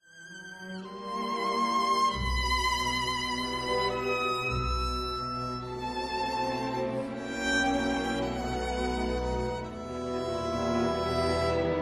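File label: Scène de la Csárda for violin & piano; No. 12; Op. 83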